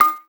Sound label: Perc Metal Click; Vari Breaks